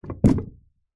wooden box crate set down hard surface

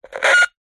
cb radio walkie talkie crackle beep button; technology; zapsplat

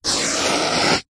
foley foam long scratch sharp

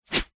sport tennis racket single swing fast whoosh

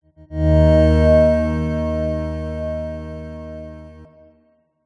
musical organ stab reverb; zapsplat

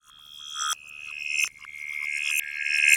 Morph Swell; bottlerocket

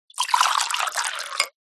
food drink still pour from jug into small glass; zapsplat

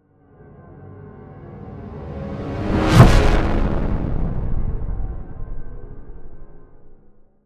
Swell To Impact Distorted; bottlerocket

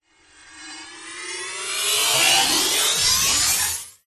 science fiction robot recharge power up fast

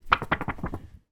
photograph shake or wobble, household

